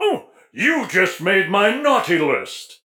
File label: Taking Damage; Bomb King